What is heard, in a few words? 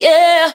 "yeah" sung